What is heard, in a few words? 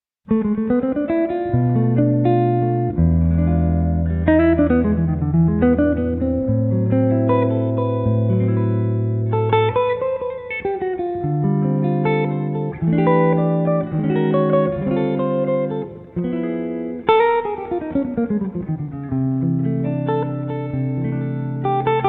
Jazzimpro